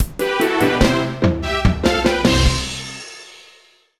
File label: Level Clear! Fanfare; mario vs. donkey kong 2 march of the minis; mario vs. donkey kong 2 minimini daikoushin; nintendo ds; 6th Floor Fanfare